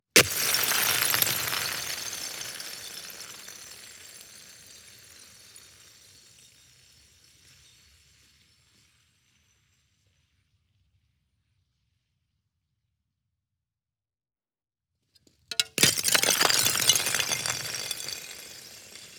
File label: EXT Ice Drop Shatter Slide